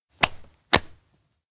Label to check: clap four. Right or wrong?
Right